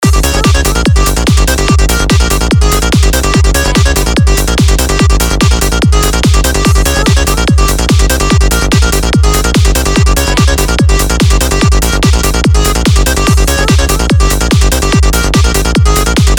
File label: Free Goa trance